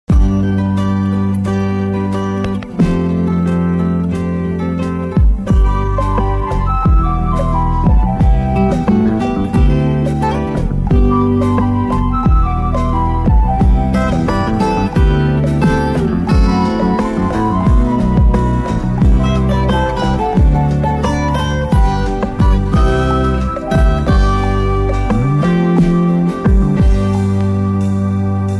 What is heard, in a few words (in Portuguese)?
Trilha; IpanemaFM; Spots Agencia Jinga